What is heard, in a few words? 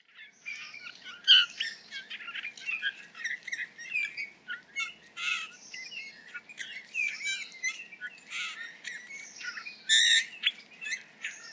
Eurasian Bullfinch - Pyrrhula pyrrhula; Juodagalvė sniegena